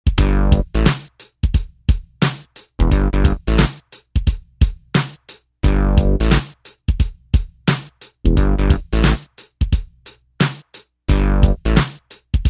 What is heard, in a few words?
funk